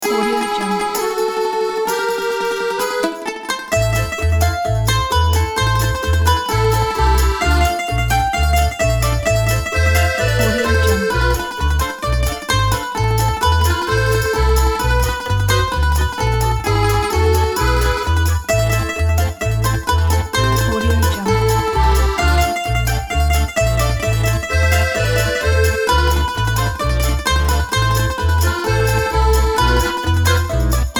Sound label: italian folk